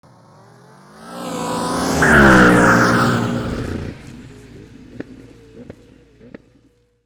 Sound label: Taking the Corner; 过弯